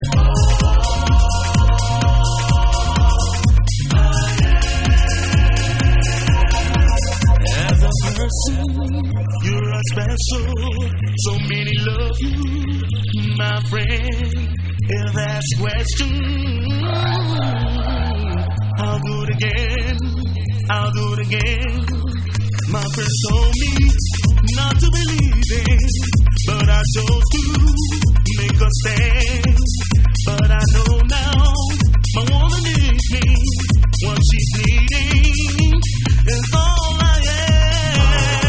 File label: Bass Mix